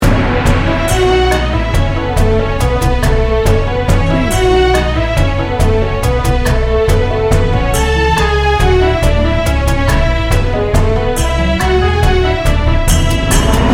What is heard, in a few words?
beijing adventures loop